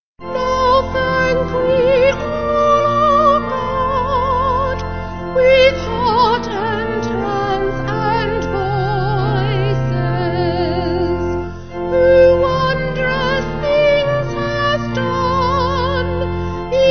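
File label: Vocal Organ, hymn; Now Thank We; Nun Danket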